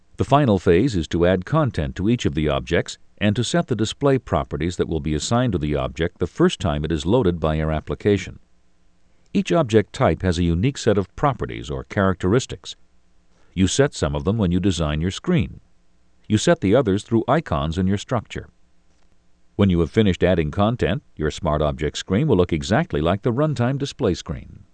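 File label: phase, techno bass drum; Aimtech Test Drive - Icon Author & CBT Express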